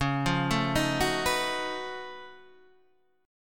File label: Gb Minor Major 13th Db